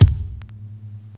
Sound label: Rock kick; Reference Beat